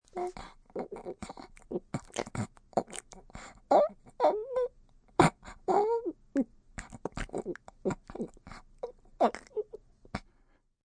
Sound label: Aliens Gurgling, Alien, baby, gurgling (human voice)